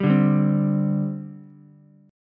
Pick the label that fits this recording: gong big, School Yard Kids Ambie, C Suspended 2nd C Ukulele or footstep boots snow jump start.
C Suspended 2nd C Ukulele